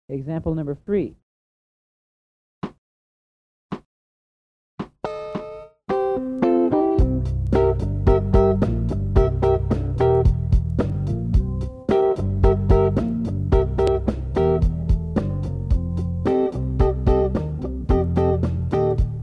Funky Blues In C, guitarra

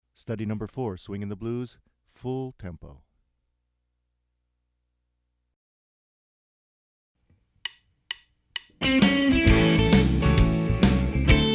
Blues; Blues You Can Use

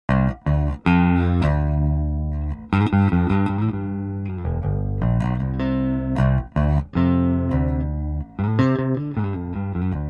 Chorus Bass; Dan Dean

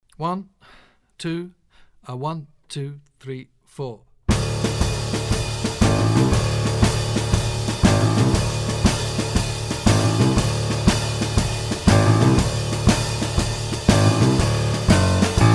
Five to One Shuffle backing track

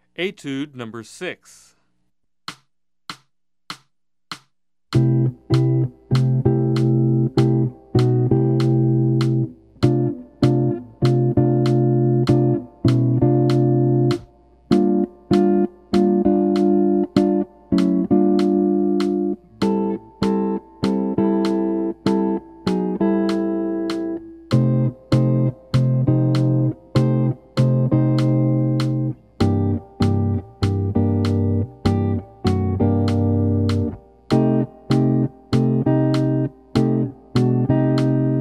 Guitar Track Beginning Jazz Guitar; etude 06

